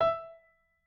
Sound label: piano